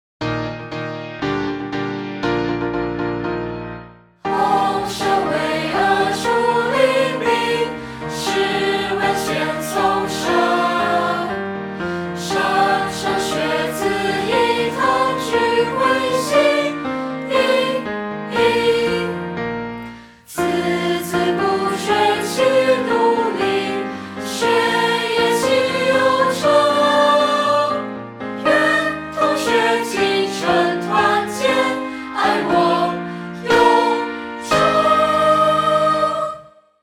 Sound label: School Song; YongPing